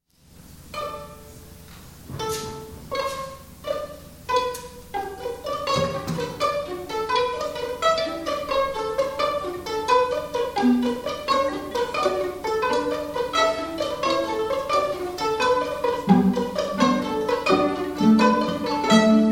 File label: kalimba african thumb piano; Ossining High School Spring Concert April 26, 2016